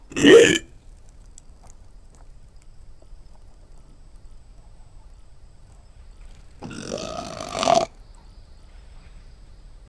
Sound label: a pretzel falls from a